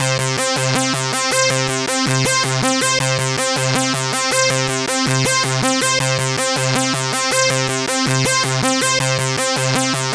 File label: gro 160, Hardcore Loops, Arpeggios